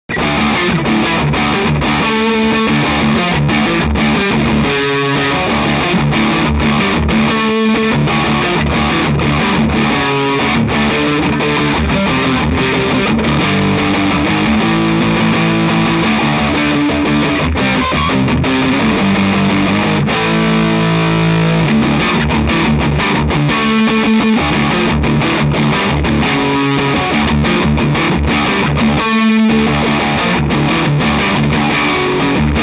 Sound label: Bridge Neck Heavy, pickups